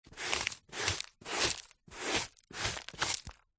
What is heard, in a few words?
sweeping up dirt debris carpet dustpan brush